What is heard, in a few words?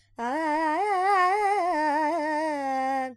Lydian, Vocals, Carnatic Female, Alaps, Dry; Sample Banks